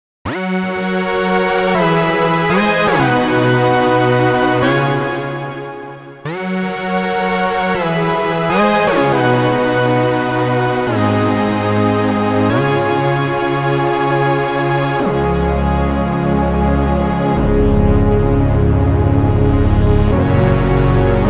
OB-E Epiphany Waves

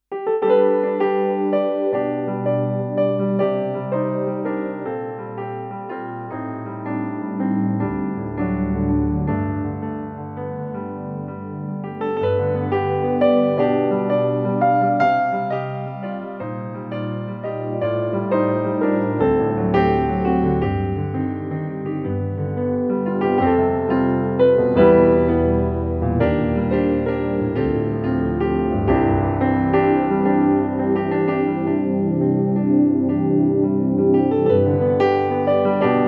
Japan Piano Mid DIP EQ

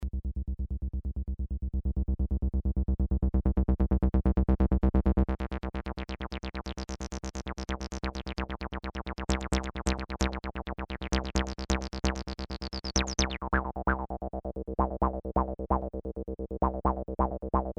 Behringer TD-3 Test

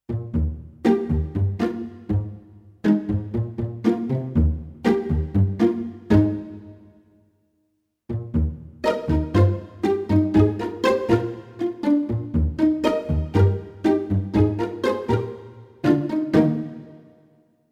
Strings Pizzicato; V3 Sound Sonority XXL

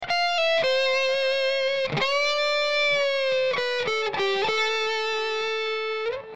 Melodic Lead

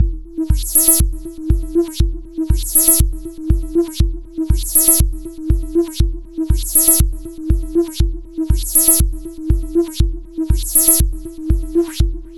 Dreadbox Drips V2 Delay bd

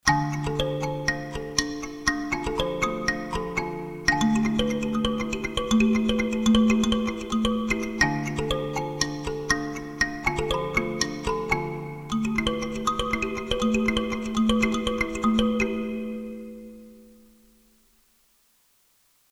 instruments plucked kalimba sequenz

